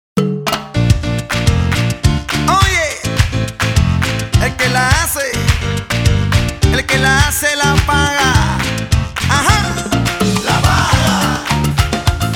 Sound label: salsa; salsa dvk duo